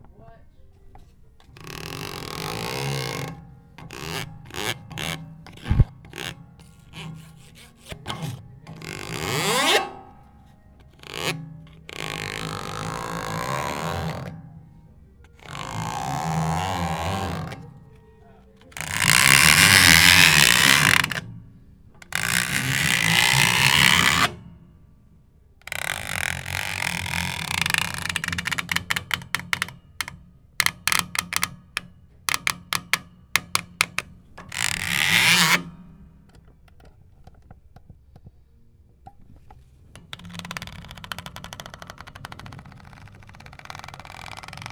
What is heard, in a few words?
Inside Piano